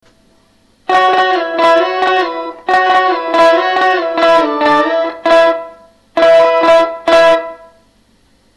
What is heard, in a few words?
pitch